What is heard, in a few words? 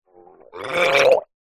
male retch short vomit; human